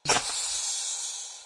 freeze; Soldiers Combat

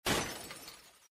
crash, cars

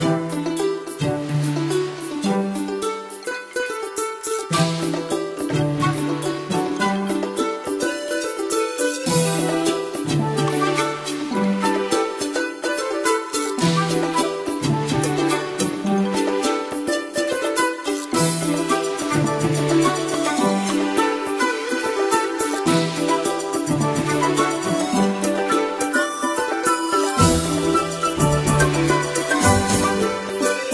gamemusic; candy match3

